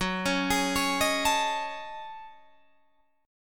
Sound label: Gb Minor 6th Add 9th Gb down Guitar DADGAD